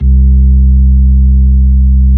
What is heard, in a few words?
B-3 Club Xfade, Keyboards of The 60's and 70's